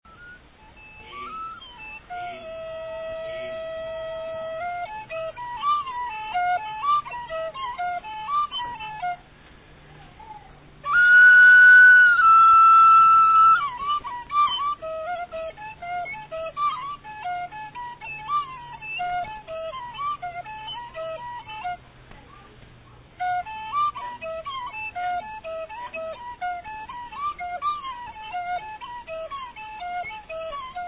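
Instrumental Music, Hmoung Lay Folksong Phi Hmoung (Flute); Khithot Phusao; Sam Neua/2000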